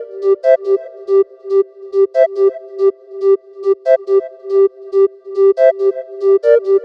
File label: Fluteman, dubstep